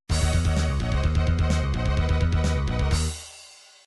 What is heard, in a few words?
mission failed; super nintendo metal warriors